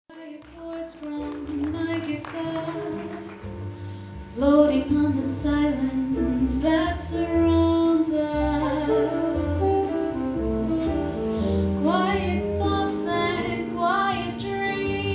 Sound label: Jazz Duo